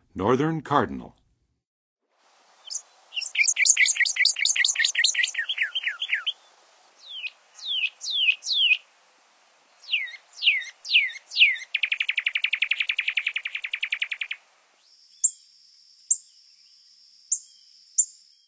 Northern Cardinal, Songs of Birds of North America; Western USA; Western USA Songbirds